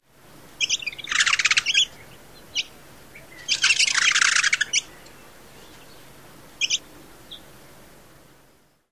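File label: outburst of notes, Canyon Towhee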